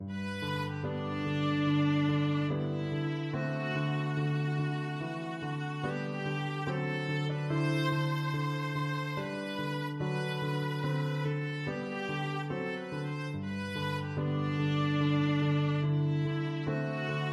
raindrop, viola

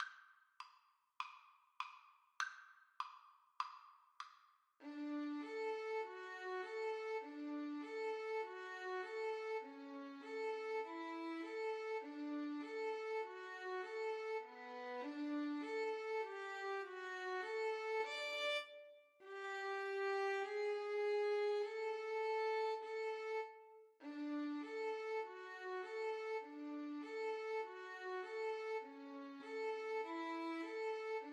un poco adagio violin duet

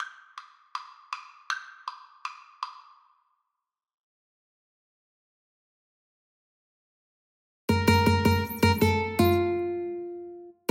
Ukulele duet

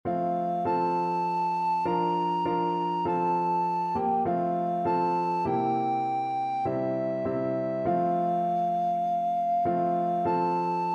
my jesus, alto recorder

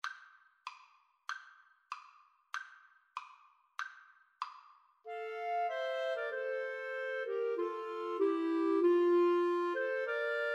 Clarinet Trio

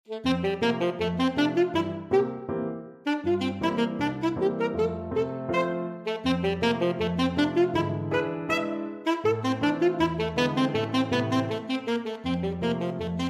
pizzicati, saxophone

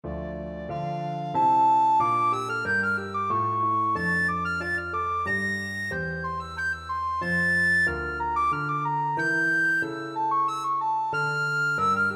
rondeau, recorder

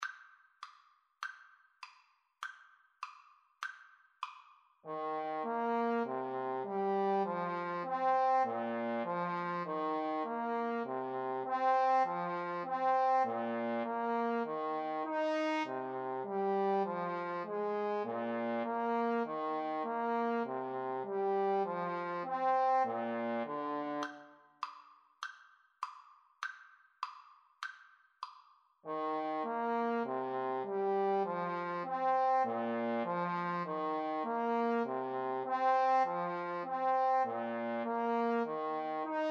Trumpet Trombone duet

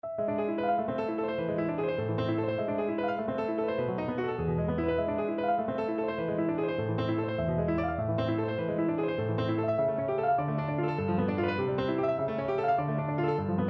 smetana chason, piano

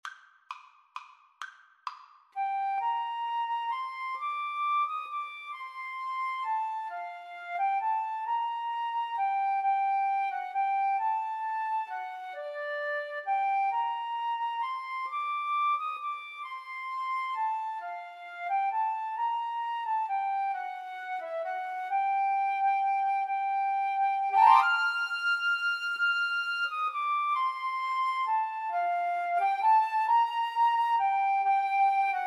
flute duet

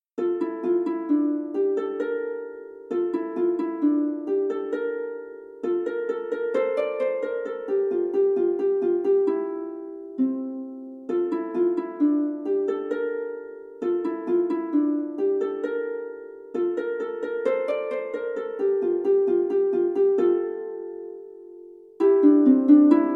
ukulele

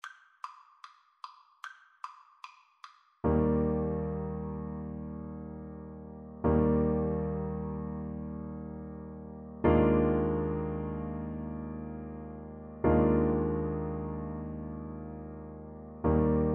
dvorak largo, Tuba

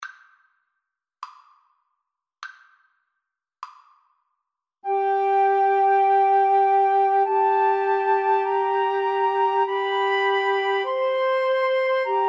Flute Trio

